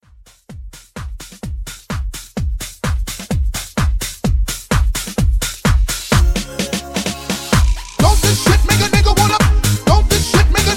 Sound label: Tiktok Dance Hype 128